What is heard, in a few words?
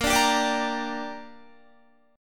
Bb 6th, Guitar; strummed